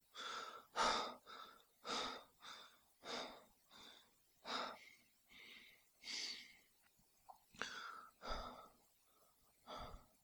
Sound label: heavy breathing